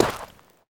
sand, footsteps; player

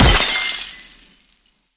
zombie smash window; scripted sequences; hideout

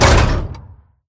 move clunk, combine train; mechanical units

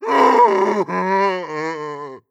idle moan dry, zombie vox; creatures

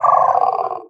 idle, headcrab reviver

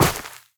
step run, footsteps player grass

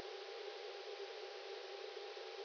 roomtone, ambient tones

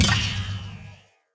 step run, footsteps, player, lightbridge

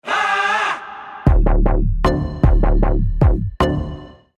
deathcam

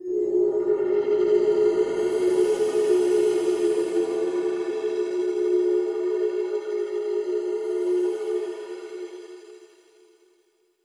amb unique hotel street; Half Life Alyx

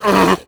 grunt, zombie; creatures

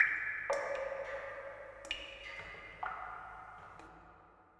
ambient water drips; nature; Portal 2

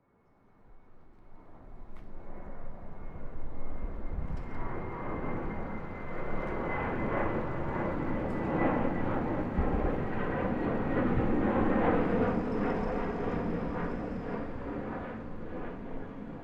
airplane; Counter Strike Model Pack; CS:GO; dust2

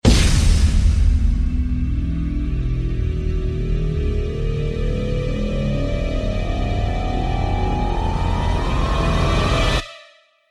round ten sec count, music

